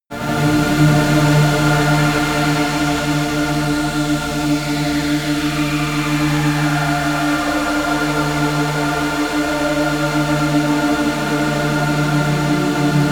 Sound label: running energy, music elements